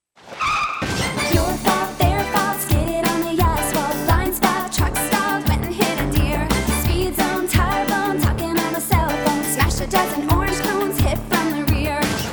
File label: Full Sing